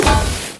Activate Bomb; multiplayer kart racing; boom karts; android

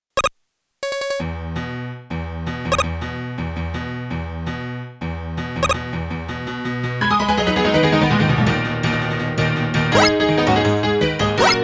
piano remix

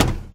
footstep metal low walk; MONO; ProSoundCollection v1 3

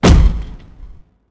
Door Closed Kick

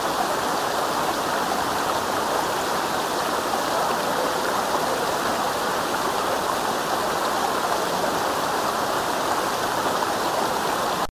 waterfall medium; The Sims 3; Windows

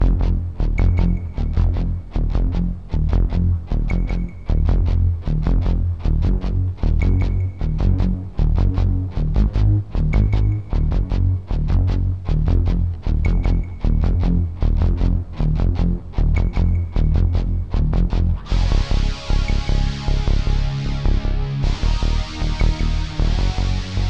city tension; alien earth

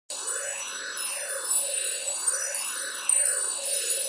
Freezer Cast

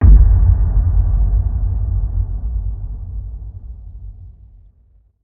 Sub Hit

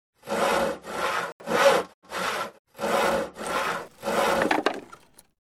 Cut 2 Boot

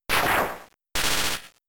Thunder Shock; pokemon; gen 3; attack moves

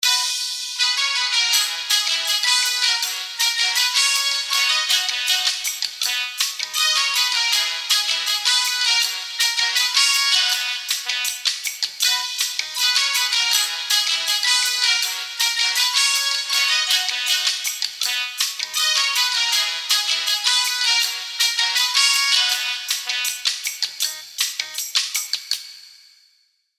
Elevator Muzak; Mass Effect

